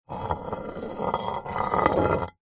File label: industrial hammer drag scrape on hard surface; zapsplat